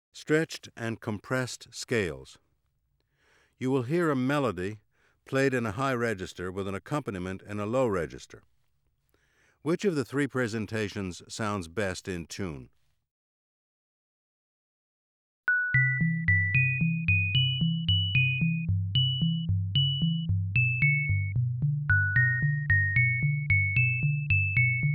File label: stretched and compressed scales